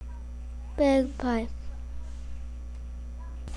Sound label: bagpipe